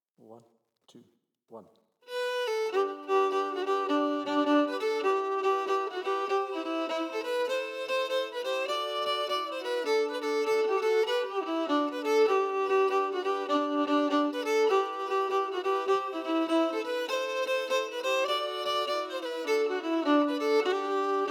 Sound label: melody emphasized